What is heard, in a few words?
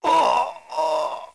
die